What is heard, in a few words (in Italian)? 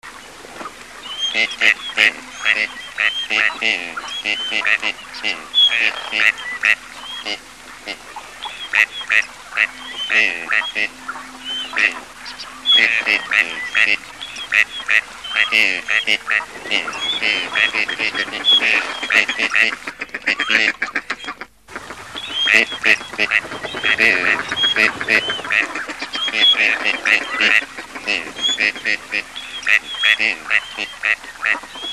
Canapiglia